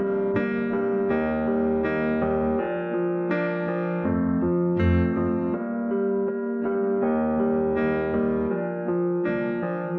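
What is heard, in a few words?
no drums, instantaneous mixture; SiSEC11; underdetermined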